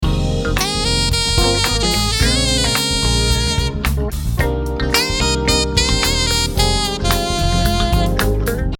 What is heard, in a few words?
sax impro